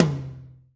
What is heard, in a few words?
DANCE SET, DRUMS